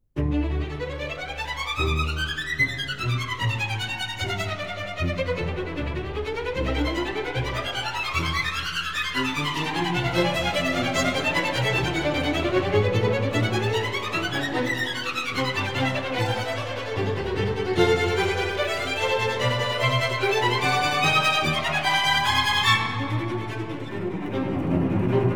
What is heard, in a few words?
Preludes Allegro vivace